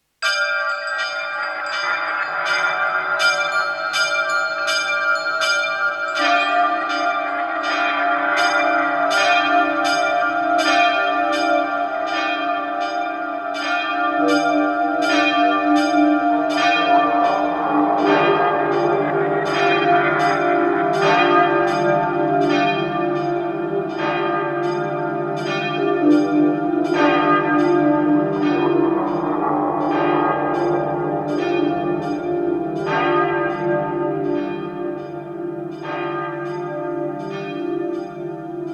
Korg Wavestate MkII The Bells Factory Sound

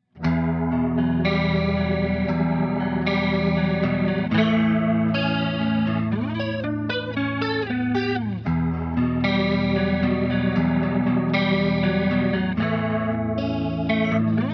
Eventide Tricerachorus Tribrato

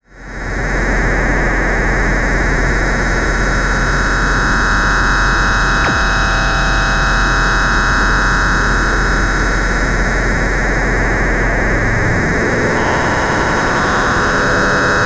Erica Synths Perkons HD 01 Drone

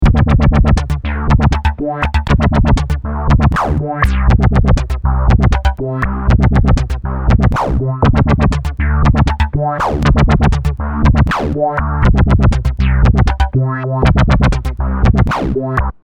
Snap Shot Sequencer; Waves Flow Motion

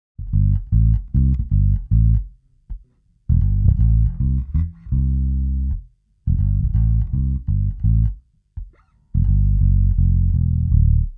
ampeg PF 50t Active J Deep Finger

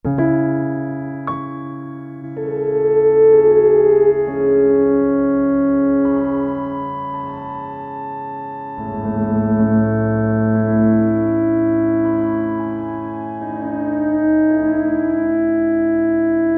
EHX Pico Deep Freeze Auto Mode Piano

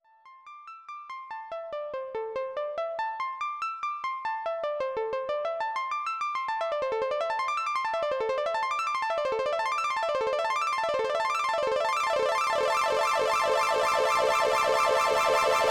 Juno Arp Trigger

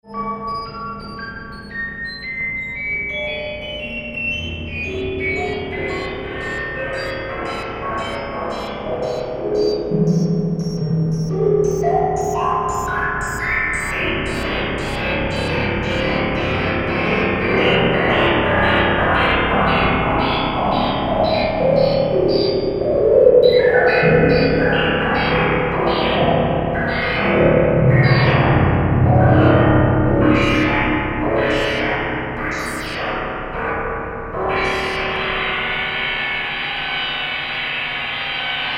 Spring Reverb Feedback FX plus Frequency Shifter

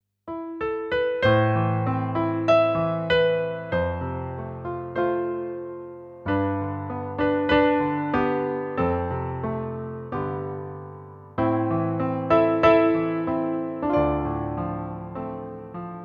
Stereo Grand Piano

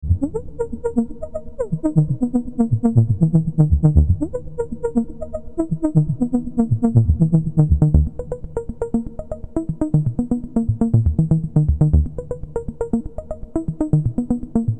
arp2600 nice sequ

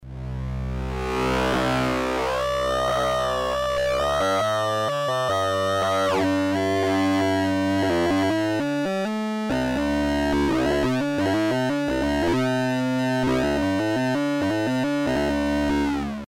MS20; FreqBox

